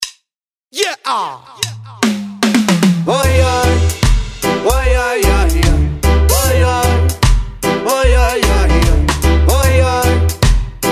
reggae; Sound PooL

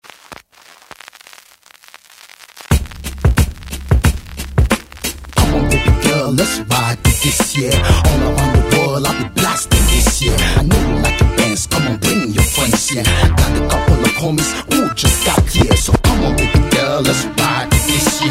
hiphop; Sound PooL

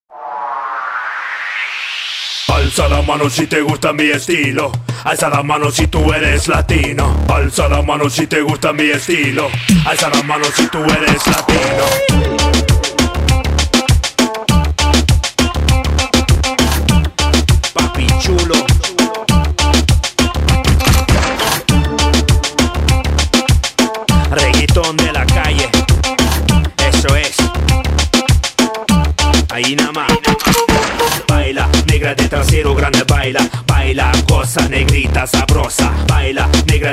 reggaeton; World Reggaeton; Sound PooL

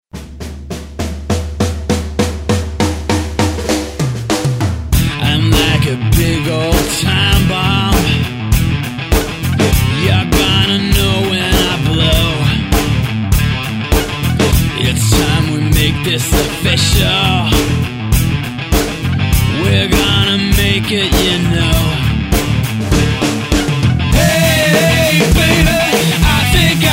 rockpop; Sound PooL

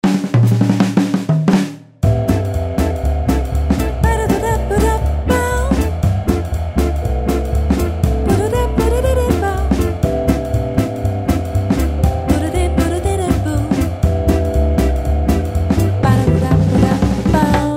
swing; Sound PooL

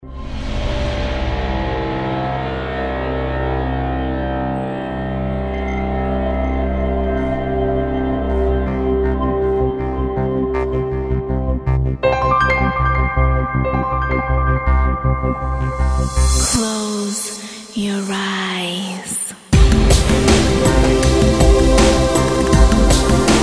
ambient; Sound PooL

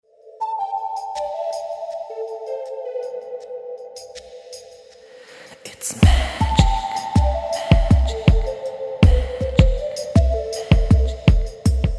ambient; Sound PooL

